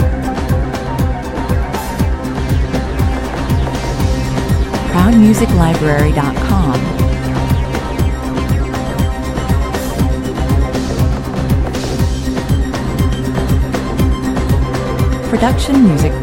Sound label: techno loop